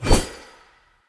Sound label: Long Sword Whoosh; Weapons; Pothia